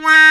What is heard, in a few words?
Trpts; Club-50 - Foundations Roland; Trumpets; Wa Trpts